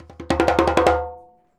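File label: 100 JEMB, djembe; Univers Sons; Basicussions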